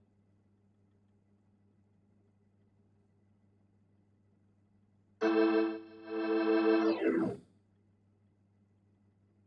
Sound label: Hammond